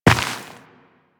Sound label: Step Dirt, Footsteps Walk; DE; Warframe; Tenno